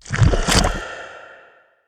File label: boss umbra sphere rise